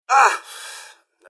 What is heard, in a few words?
generic robot hit; mrhandy; npc